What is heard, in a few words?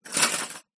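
Silverware Drop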